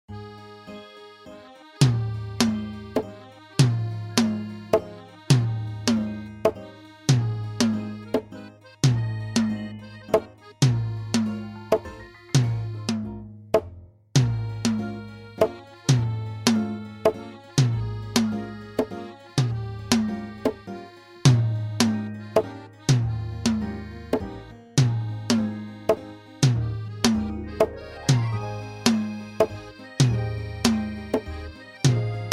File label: 3/4 Waltz